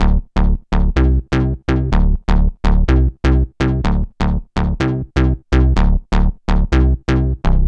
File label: 125 D Lunar Synth Bass